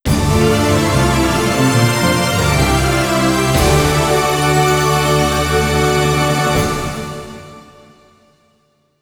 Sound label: Stage Complete [Arrange]